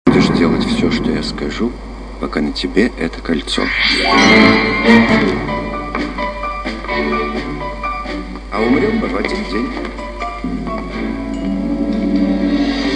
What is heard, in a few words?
tango